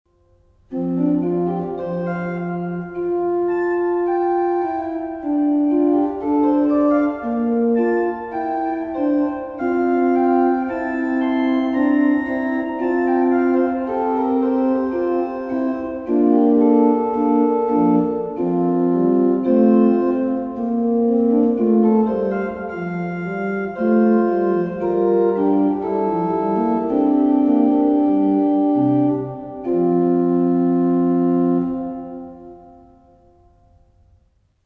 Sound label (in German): Orgelvorspiel; Hörbeispiel "Wohl denen, die da wandeln" GL 614 / GG 543